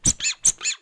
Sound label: Rubber Ducky Sms